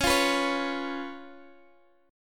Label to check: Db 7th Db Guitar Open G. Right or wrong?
Right